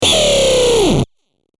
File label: SCREECH C DRY